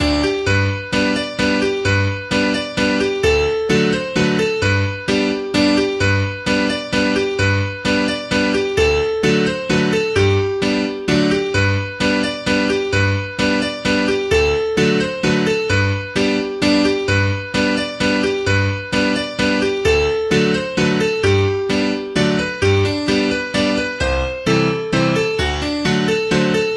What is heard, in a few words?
Mazurka; Mazurka De Lapleau